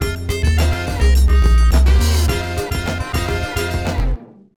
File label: Jingle Draw